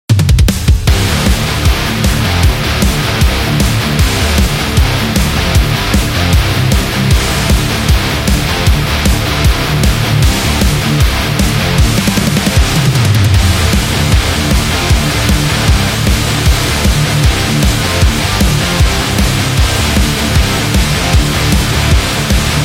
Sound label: High Energy